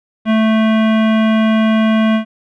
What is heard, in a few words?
Waveform Rectangle